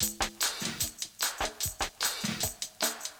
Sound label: Sauce Loop 75 bpm, Drums, Percussion; Loops; Ableton Live 12 Lite; Core Library